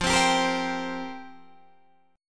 F Suspended 2nd Suspended 4th F down Guitar Open D